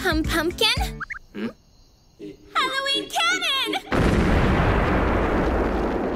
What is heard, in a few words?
Cannon; Halloween